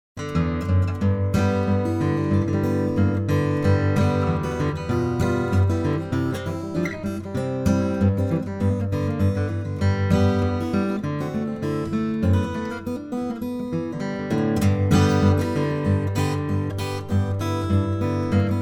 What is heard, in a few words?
blues